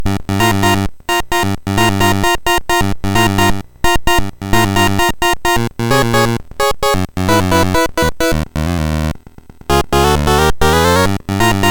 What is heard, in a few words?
Honk, MOS6581R3